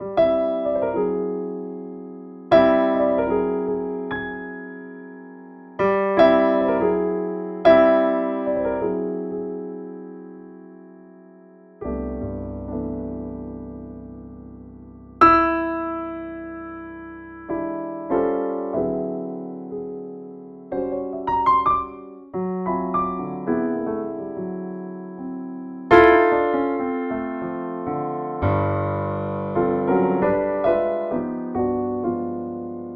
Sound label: on Czech Grand; Ant Petrof Grand; Pianoteq